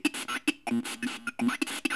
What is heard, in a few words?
comm static; Ambient Audio; XDK4627 BIOS and XDK Launcher and Dashboard(for Retail); ogxbox